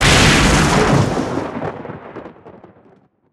lightning effect; Factorio

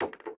biolab beaker; factorio

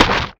axe mining; factorio; boompuff

